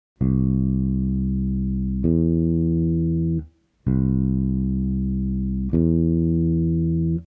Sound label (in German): grosse terz